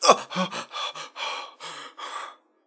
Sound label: low health; doctor